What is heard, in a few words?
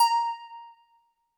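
Jazz Harp Kawaii A#4; keys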